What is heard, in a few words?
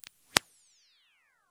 ep0 Imp Resp